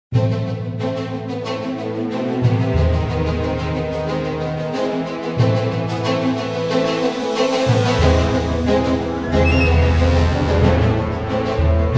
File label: Action Adventure Night Castle